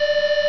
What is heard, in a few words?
bleep